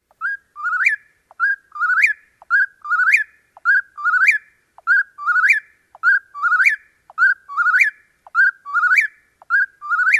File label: song eastern